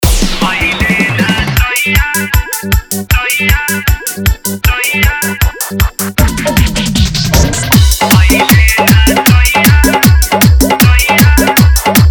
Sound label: Full Dhollki Bass Dance Mix